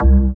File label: Dance Bass; Sound & Vision - Gigapack II CD 1